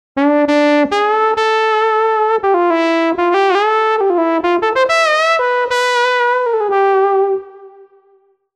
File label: Koz Lead; TURBO VL